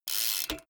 bmx bike crank freewheel fast short

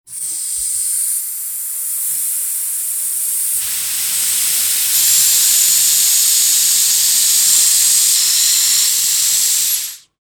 ballon air release

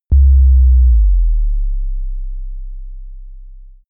bass drop short

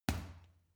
boxing punching bag uppercut soft

